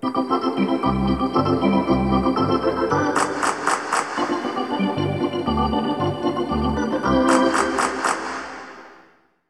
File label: Hockey Organ